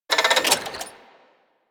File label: Artillery Mech; Warframe; Vehicles; NechroMech; Abilities